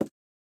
button Up; goldengrimoire

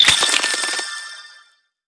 cocoon Hatch; butterflystaxx

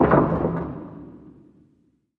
quick Stop; mercy of the gods

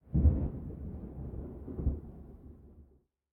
thunder; the wolfs night

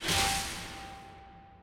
symbol Hits Counter; turn your fortune

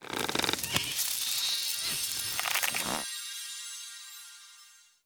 fs Beanstalk Grow; jack and beanstalk

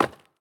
reelBounce; templeofnudges

